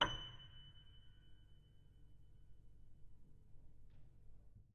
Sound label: F sharp 3